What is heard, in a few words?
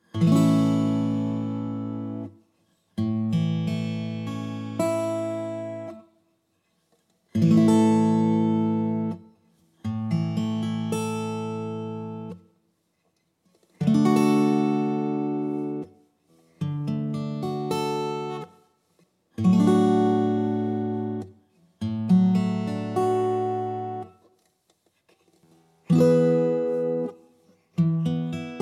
Open Tunings For Blues Rock Guitar